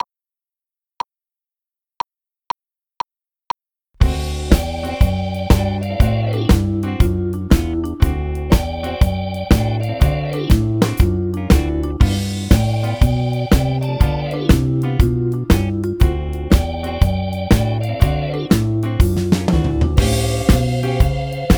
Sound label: Monochrome Sleuth Keys Version